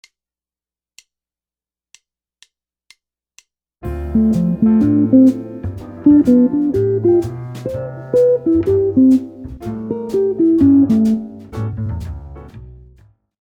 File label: easy jazz arpeggios, guitar